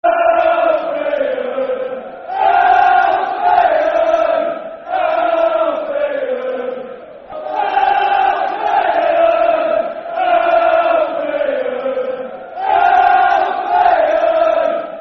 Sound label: Albion, Albion, Albion Football Chant (Soccer Song), Brighton; Brighton Bhafc